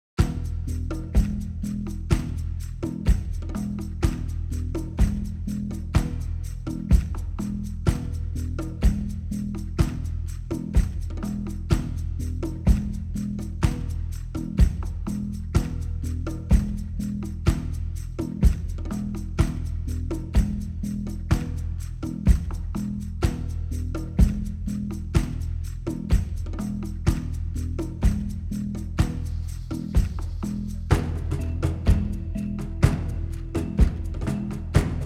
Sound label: Cliff - Combat; Crowcrest Cliff